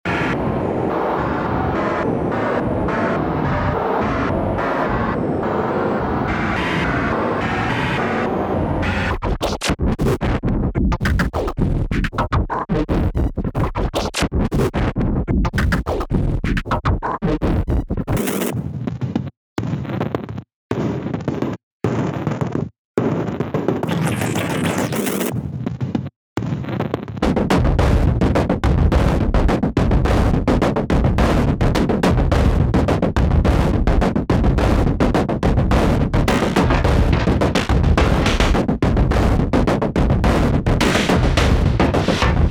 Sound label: noise to signal 106bpm